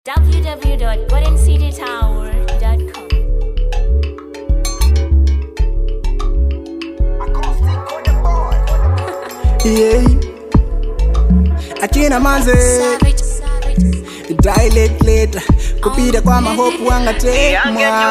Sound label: acoustick